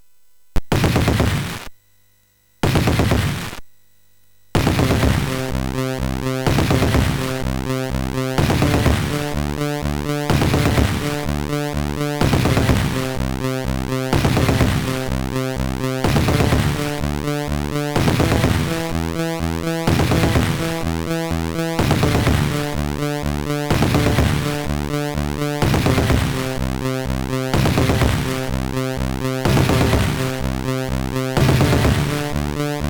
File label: Music, MOS6581R3